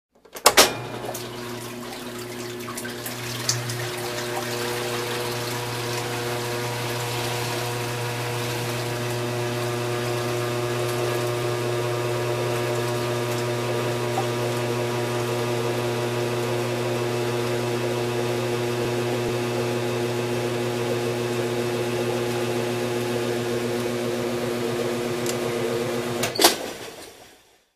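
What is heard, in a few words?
Washing Mach Filling, washing machine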